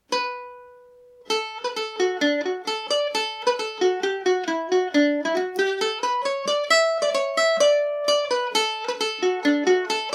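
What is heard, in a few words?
D major, irish mandolin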